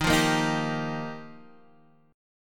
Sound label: G Minor 7th Sharp 5th, Eb